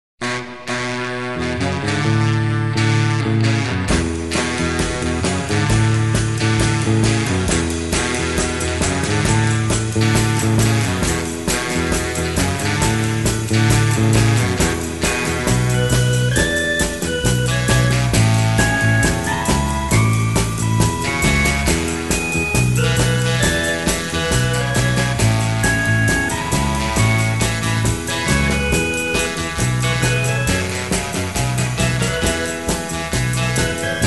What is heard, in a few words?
Bed Of Music; Pams